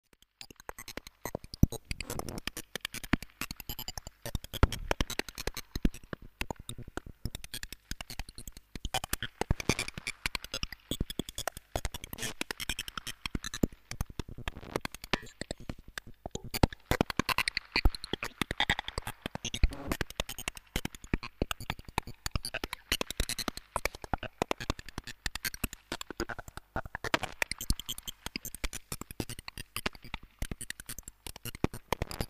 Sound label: Dropping Beats, RS370 in analogue poly mode